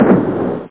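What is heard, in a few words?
evil explosion; SecalDemo; Silly; Amiga 8-bit Sampled Voice; 8,363 sample rate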